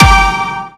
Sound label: hitsound; HitAndKillsounds